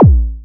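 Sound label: bass drum zip, drum machines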